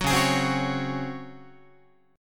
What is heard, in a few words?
Db Major 7th Suspended 4th, Guitar; strummed